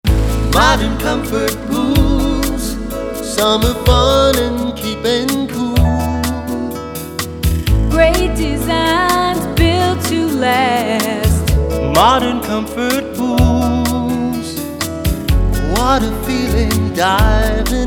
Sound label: Jingle; Modern Comfort Pools